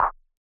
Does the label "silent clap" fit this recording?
Yes